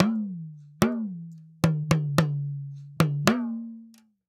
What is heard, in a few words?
Talking Drum, African; Sonic Foundry (Sony Creative Software); Continental Drift Aftershocks